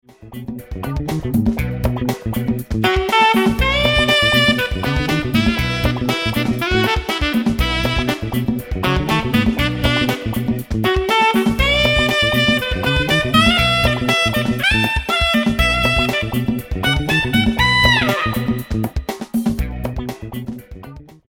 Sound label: Funk Alto Marma Small